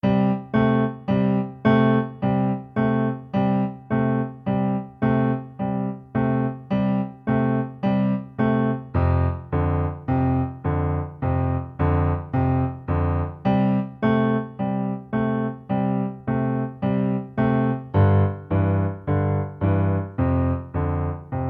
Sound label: Left hand Complete, piano blues